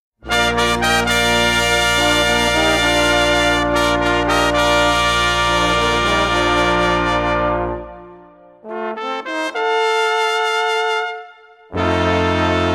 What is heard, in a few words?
Fanfare